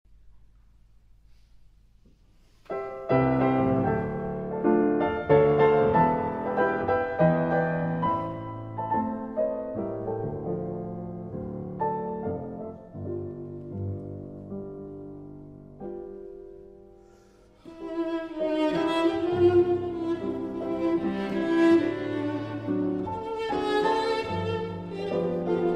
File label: trio; kreisler; refrain